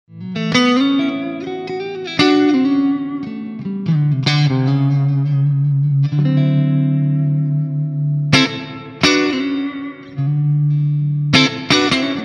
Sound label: jazzy